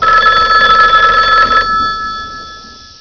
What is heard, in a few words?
telefon; quake2